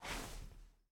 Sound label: Glass Retake; Game rip; MiSide